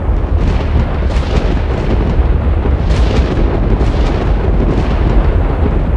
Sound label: radar, ambient